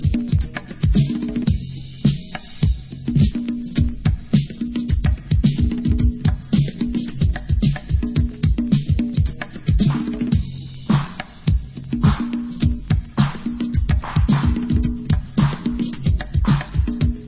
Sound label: b beat classic (instrumental)